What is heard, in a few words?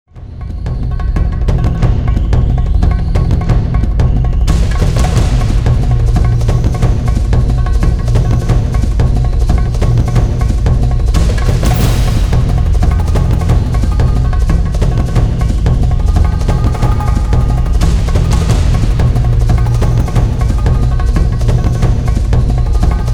drums track fast